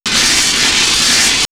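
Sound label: Electri City, electronic fx; X-Static Goldmine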